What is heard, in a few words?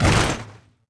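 metal hit medium; objects; Star Wars Obi-Wan; XBOX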